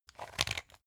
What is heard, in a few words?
pepper mill slow; berufe; gastronomie; soundpool